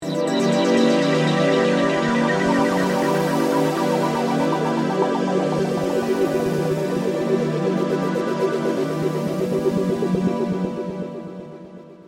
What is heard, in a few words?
Music, Sweeper, Synth, Dark, Pulse; Sweepers (Sound Effects:Sound Design); BlastWave